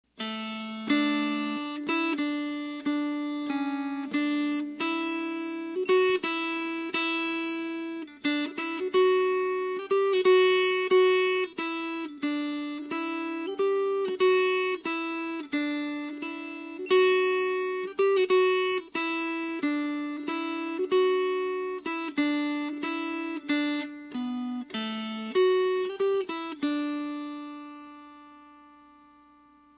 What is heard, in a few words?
Melody; Kathleen O'Moore